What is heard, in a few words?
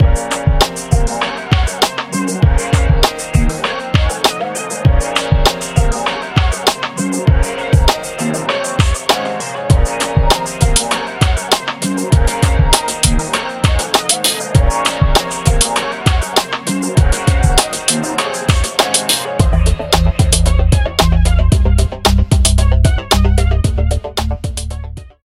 Sampler